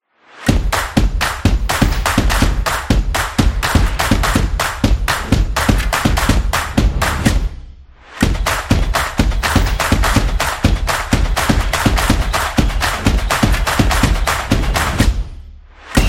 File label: Fiesta